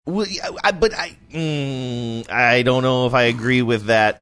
making series funny sounds saying i dont know if agree with that